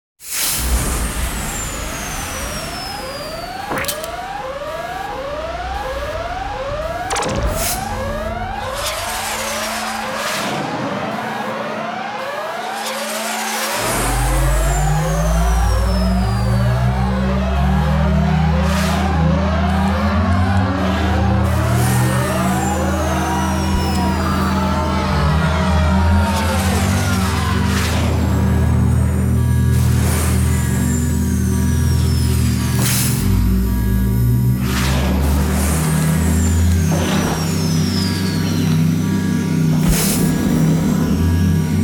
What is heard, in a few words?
Film music